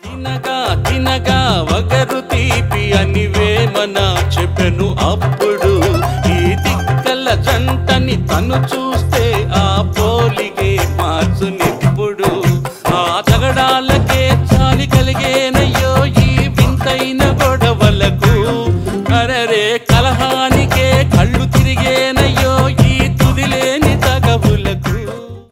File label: Telugu Old